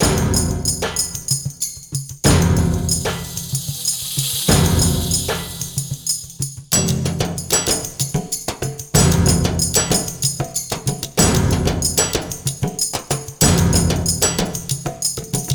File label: Music Fight Order Percussion; 7 Mages